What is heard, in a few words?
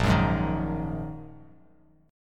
A sharp 7th Suspended 4th Sharp 5th, down, Bass Standard; strummed; chord; JGuitar